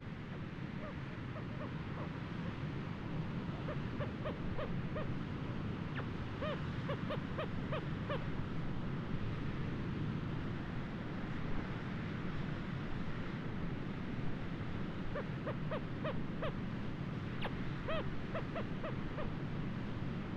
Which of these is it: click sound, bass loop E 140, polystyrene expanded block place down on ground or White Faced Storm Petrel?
White Faced Storm Petrel